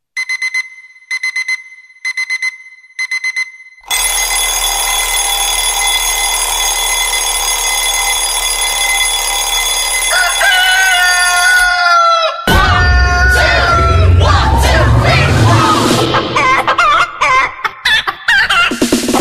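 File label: cheerful alarm clock, alarm clock ringing, roosters singing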